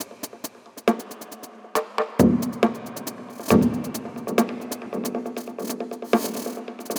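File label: percussion loop, drum n bass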